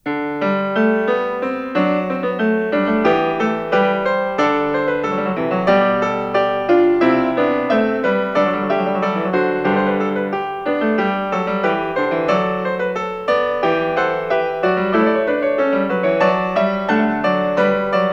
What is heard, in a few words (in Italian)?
Variatio 30. Quodlibet. a 1 Clav.; 1955 Recording; Bach; 골드베르크 변주곡